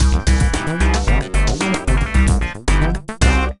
Sting End Level; Monsters vs Aliens; NDS

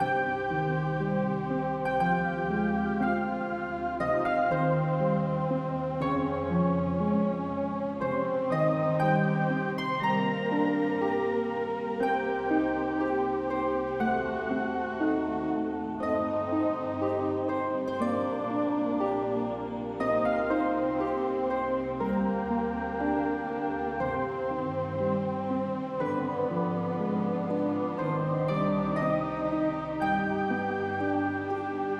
Spirit Dramatic Music; Robbie Swifthand and the Orb of Mysteries; PS4

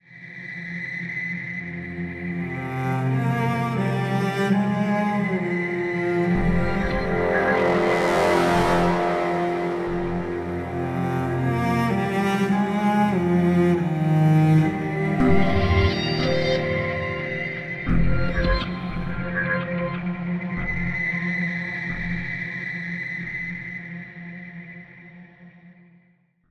Cello Ambient; crysis 2; ps3; xbox 360

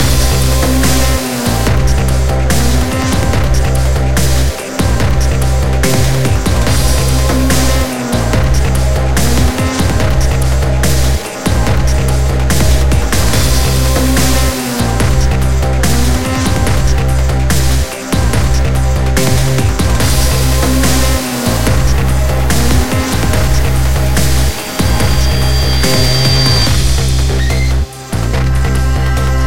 Combat; Pirandello Kruger; mirror's edge gamerip

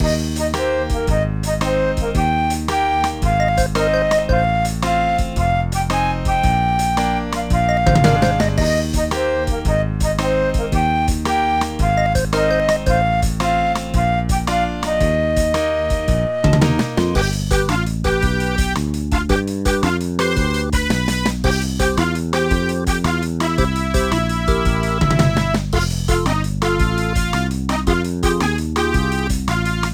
Game Over; Sam Power Footballer; NDS

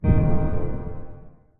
Rebloom Drum; Musician

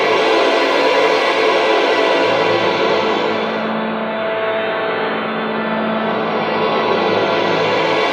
Music Stinger Stereo Loop; Plants vs. Zombies Battle for Neighborville; MushroomBoss

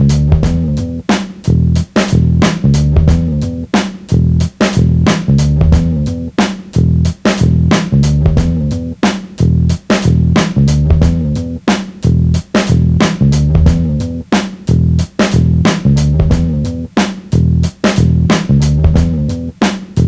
Misbehaving Low; bully scholarship edition

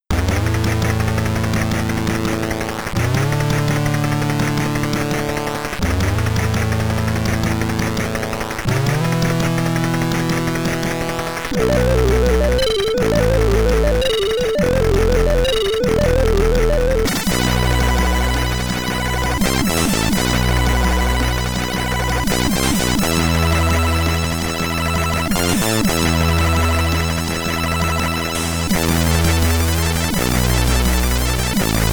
SID Stereo; Moon (Round 3+7); Ninja Spirit; commodore 64

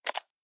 Click

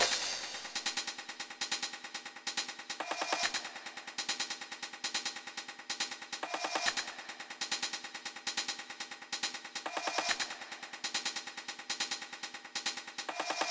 Mine Hat; Bust n Rush

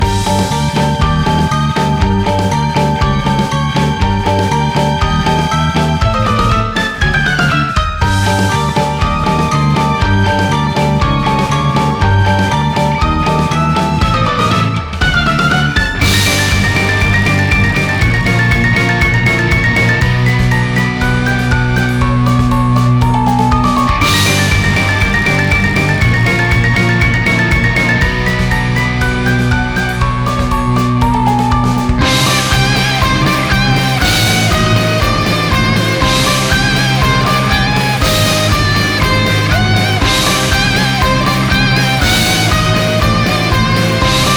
Battle

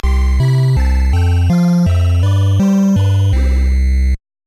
Car Lost; Micro Machines NES

